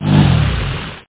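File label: Power Down; PlanetFall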